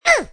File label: hit; pigmy